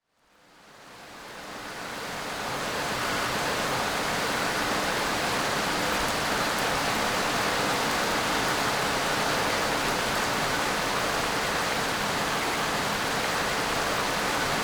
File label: rain loop